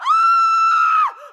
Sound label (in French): Crie